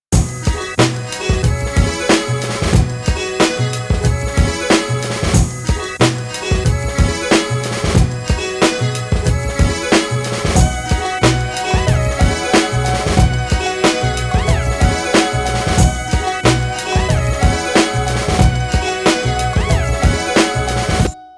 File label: Random Christmas Song